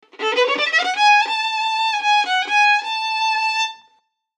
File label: My One Octave Practice